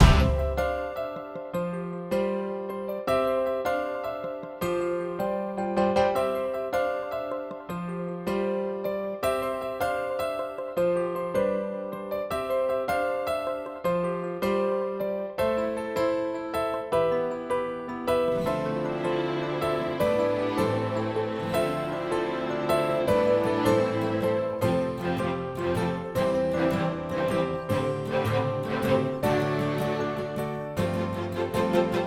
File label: Accompaniment